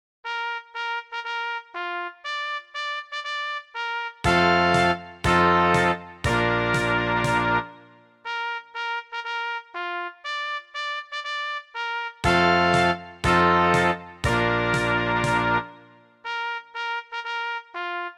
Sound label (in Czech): Fanfary